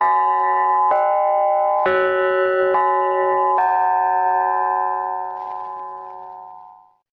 time chime; Loops; Electronic

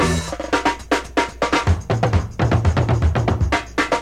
Drum loops, Rolls

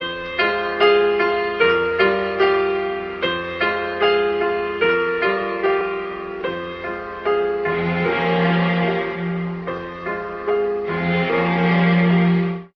piano, Loops Sad

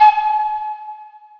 flute; Winds

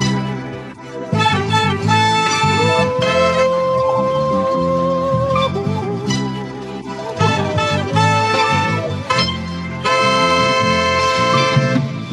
horns, Loops Phonky

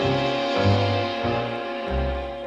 horns danger

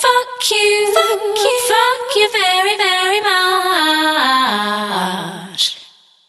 fuck you fuck you very much sung; Voice; expletives

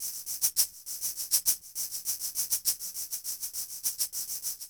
chaker, Drum loops

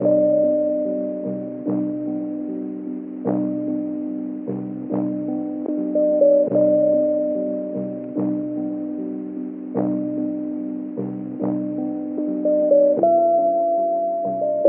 keys, Sad Loops

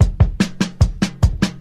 no hats, Drum loops

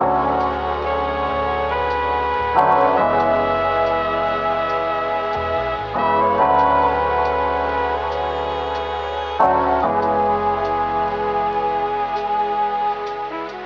keys and horns, loops, phonky

